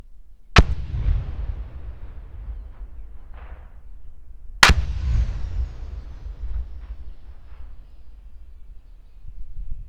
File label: Claymore Mines 200m; LS5; Brecon 2015; WEAPONS RECORDING PRIMER